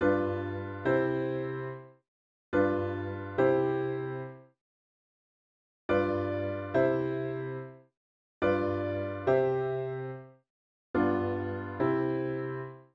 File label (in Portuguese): Resolução de acorde de sétima de dominante gera acorde de tônica incomplet; la Motte